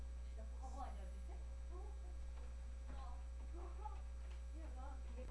traffic

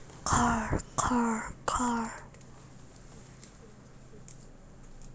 car

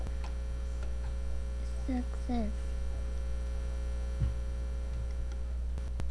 success

